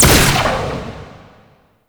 Laser Explosion; Enemies; Weapons; Warframe; Tau; Sentient Dactolyst

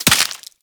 Headshot Impact Flesh; Warframe; Weapons; Bullet Impacts

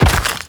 Step Dirt, Footsteps

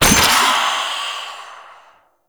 Courier Trinket Collected; Warframe; Duviri Murmur; Courier Delivery

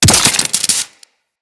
Head Shot Metal, Bullet Impacts; Weapons

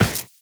Run Default, Footsteps; Warframe; Tenno

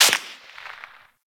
Bullet Whizzby; Warframe; Bullet Impacts; Whizzbys

